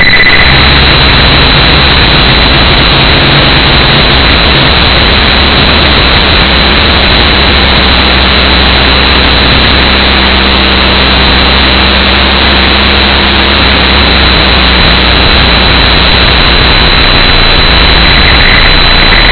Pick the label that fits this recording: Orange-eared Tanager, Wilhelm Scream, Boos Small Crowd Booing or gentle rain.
Wilhelm Scream